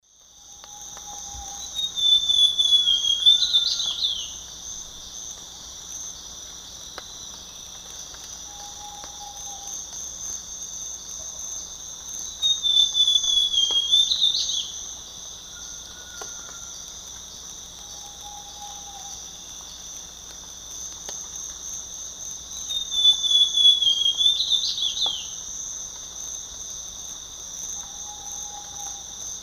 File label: Cyanocompsa cyanoides, song; S. America; Eastern Lowlands